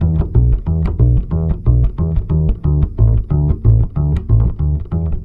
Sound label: BASS, Balkanish Traditions